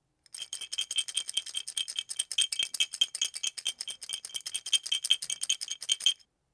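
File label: toothpicks sound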